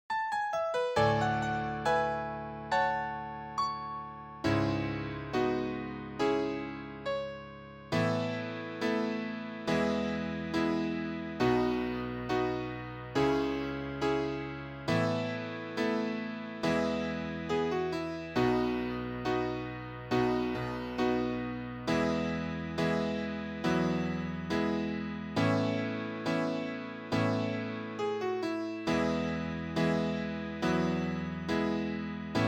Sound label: here and now accompaniment; flashdance vocal audition materials; KEYANO THEATRE COMPANY AUDITIONS